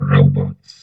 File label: VOX VOX, Electro Vox